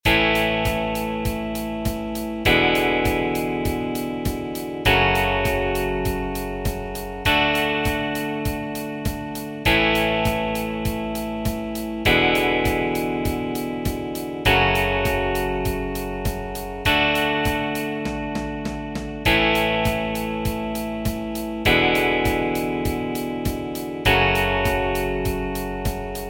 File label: Tryout track Country No Keys; 2027 MMEA conference All-State Collective Ensemble audition